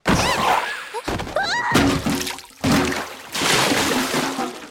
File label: section fail; Minimal Weeb - Noel v1.3